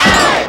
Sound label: Shout